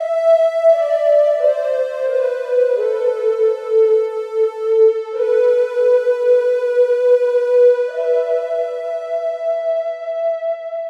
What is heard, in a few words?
SYNTH LEAD; Keyboards; Leads